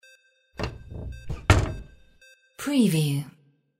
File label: DOOR CABINET SMALL; windy beach audio